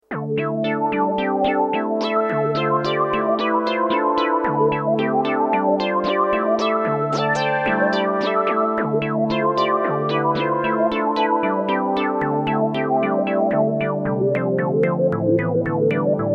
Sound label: synth; dw6000